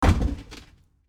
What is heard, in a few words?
stone med falling on resonnant wood; matter mayhem; soundmorph